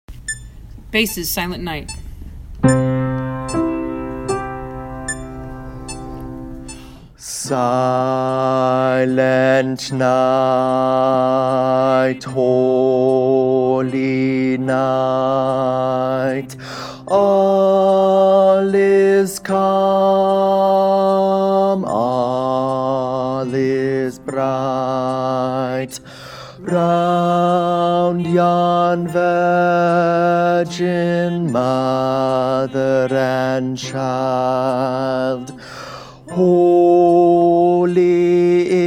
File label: silent night bass